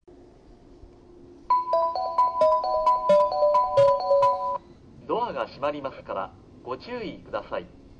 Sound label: musashitakahagi melody, station; hachiko; jreast